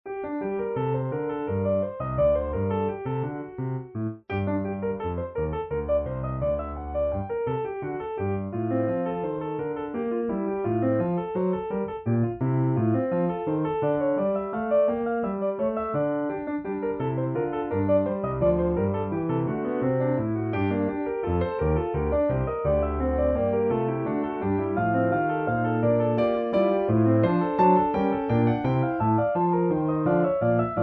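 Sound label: Piano Soft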